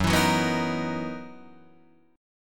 F sharp 13th F sharp Guitar Drop D

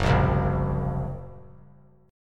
Gb Major Flat 5th, down; strummed; 3C,3F,3A,3D; JGuitar

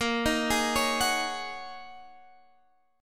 Ab 7th Suspended 2nd Suspended 4th, Bb

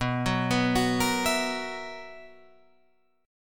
B Major 7th Suspended 4th, Guitar Open F chord; BM7sus4; arpeggiated; 11,13,11,13,13,11

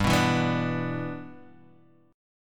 G 6th, Guitar, Half Step Down

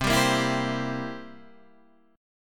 C 7th C down, C7 chord; strummed; JGuitar

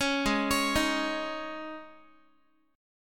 G sharp Suspended 4th Guitar chord, down; arpeggiated; 16,16,16,13,x,x; 4A,4E,5A,5D,5Gsharp,6Csharp

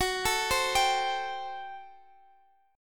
G Major 7th Suspended 2nd Suspended 4th Gb

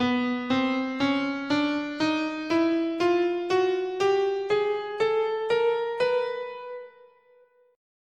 B Chromatic

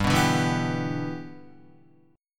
G 6th, Guitar; G6 strummed

